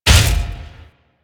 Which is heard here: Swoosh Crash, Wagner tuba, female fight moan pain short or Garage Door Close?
Garage Door Close